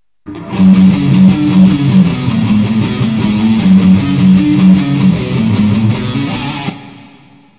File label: riff crazy, guitar